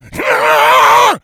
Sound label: pain; umbrella